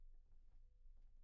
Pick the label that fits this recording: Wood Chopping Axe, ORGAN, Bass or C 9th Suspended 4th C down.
Bass